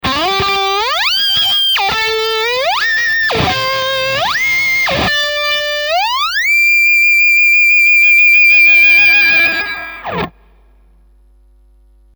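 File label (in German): 1 Oktave Up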